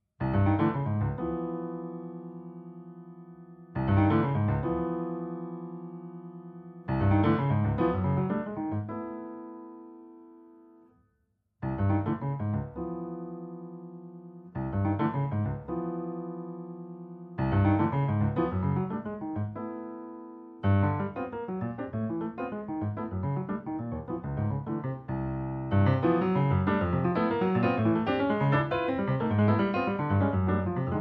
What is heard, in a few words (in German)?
Improvisation